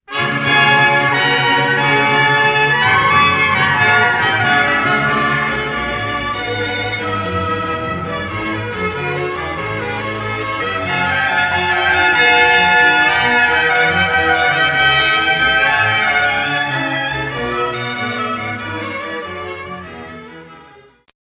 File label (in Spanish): Suite para Orquesta Danza; honduras musical 8vo grado